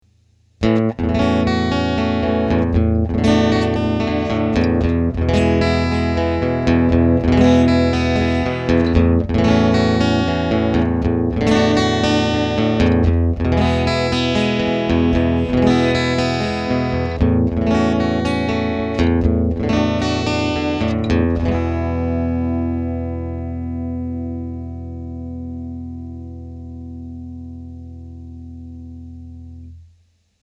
Amp1 Voodoo mono

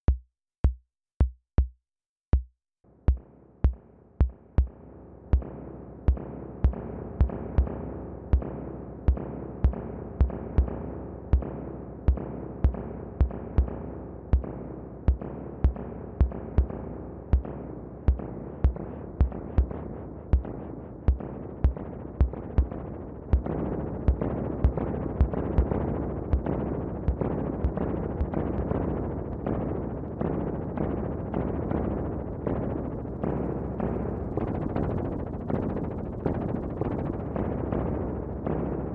Dreadbox Hypnosis Spring Reverb Bassdrum

